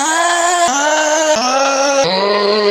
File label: chime